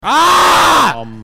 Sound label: aaaaaaaaahhhhhhhhhhhhhh